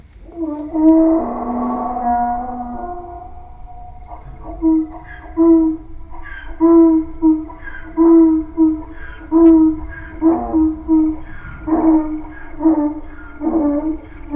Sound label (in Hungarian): távolról vadászlesről közép csala, magyar parlagi szamár